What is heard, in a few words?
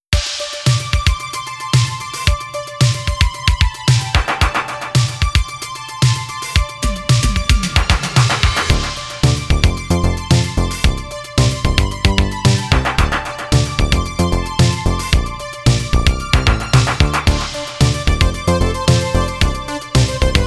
game soundtrack